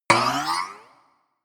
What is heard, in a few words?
spring